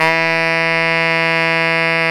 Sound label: SAX TENOR F, SAX Tenor ff